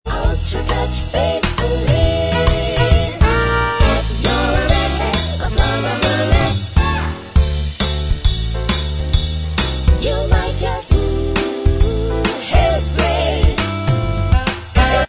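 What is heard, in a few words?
backing vocals; Hairspray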